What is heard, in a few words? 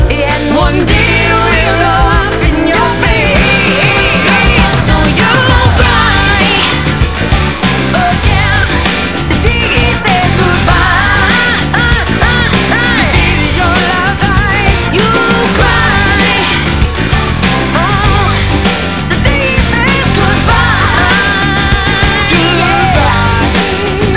cry